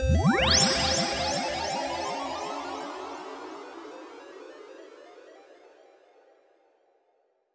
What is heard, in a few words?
Lolipop Power